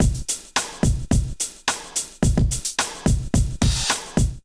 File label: Kit 108 bpm, Drums; MIDI Clips; Dobbs; Ableton; Factory Packs; Chop and Swing